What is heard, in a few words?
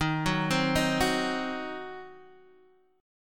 Eb Minor 6th, Guitar Open D